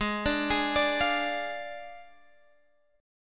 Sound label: F Minor 6th G sharp, guitar chord